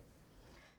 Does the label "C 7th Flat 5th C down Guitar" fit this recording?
No